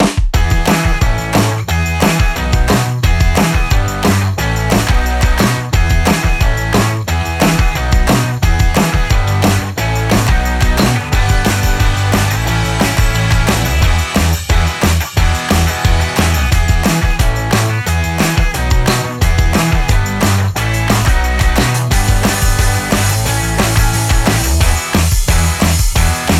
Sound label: 178B